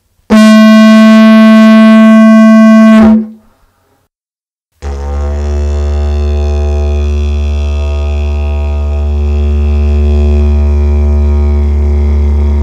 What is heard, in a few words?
Agave Didgeridoo Handmade Tuning D 73.5 Hz; Ballato